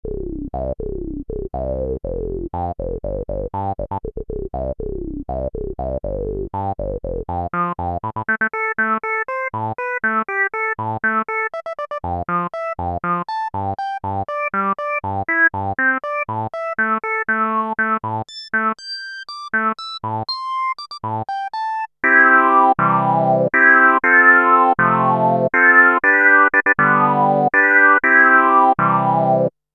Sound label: filter, rez 12 pole; e-mu virtuoso 2000